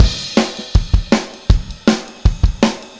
Take Your Toms Off - Chorus - Full Mix, Drums; Sonic Foundry (Sony Creative Software); Drums from the Big Room The Mixes; Demo Projects

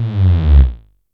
JNG BASS, Yamaha DTXpress

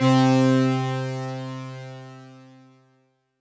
Synth C, Synth Prophet One Shots; Electronisounds

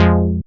stab jank, stabs

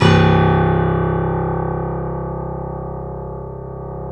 KEY, Steinway ff

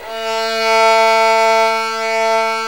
SOLO VIOLIN; AKAI S-Series CD-ROM Sound Library VOL-7

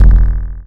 DSP Hit; Sonic Foundry (Sony Creative Software); Twine-IDM

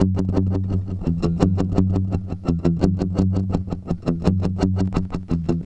Guitar, Trip-Hop Loops

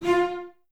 Cellos F 4; Miroslav Vitous; String Ensembles; LINKS ORCH

